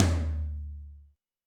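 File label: Tom Ambient Lo, Alesis D4 drum machine; 300 Drum Machines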